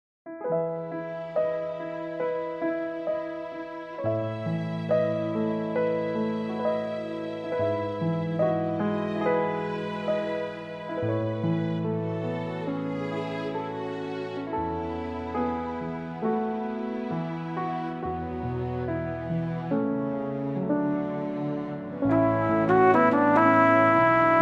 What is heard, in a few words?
Oboes A flugel